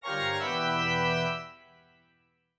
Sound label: hardware disconnect